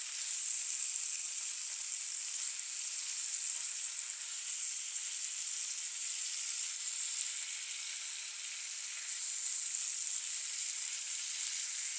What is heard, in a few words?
pan fry